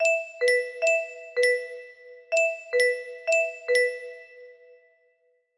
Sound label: music box melody